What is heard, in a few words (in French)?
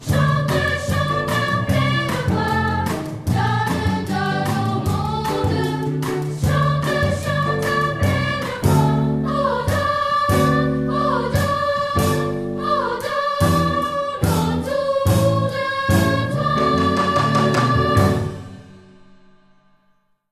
donne donne donne voix polyphonie